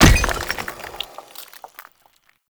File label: Stone Pillar Destroy; Duviri Murmur